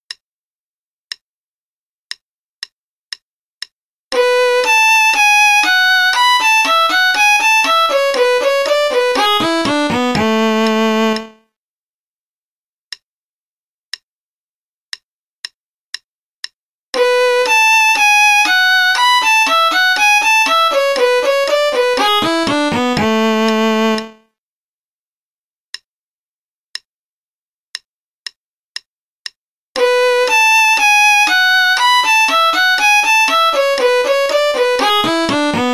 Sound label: Play-a-long Concerto in B minor, qn=60; Rieding